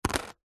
money coins x2 australian 2 dollar coins set down on plastic lid; foley; zapsplat